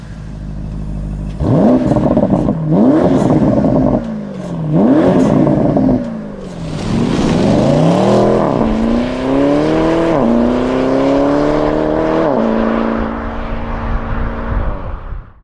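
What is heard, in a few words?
PORSCHE PANAMERA TURBO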